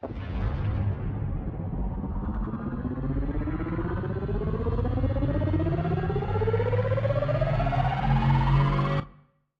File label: countdown riser; countdown idea; Sports